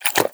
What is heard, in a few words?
breath in, headcrab